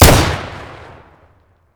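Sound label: fire close, shotgun; weapons; BOITO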